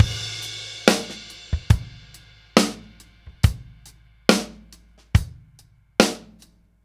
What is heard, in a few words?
Ballad Loop, Drum, 70s Ballad; Drum Tools